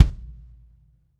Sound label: basskick; osu